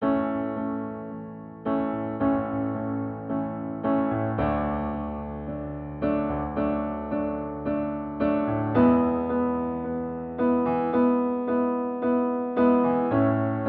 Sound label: I IV V I Piano A